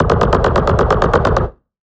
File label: Gun Shot